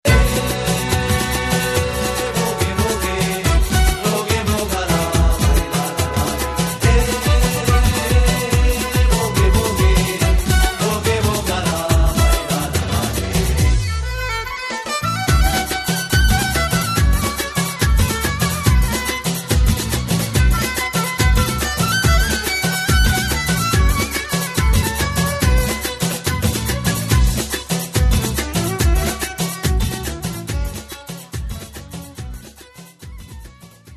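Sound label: Instrumental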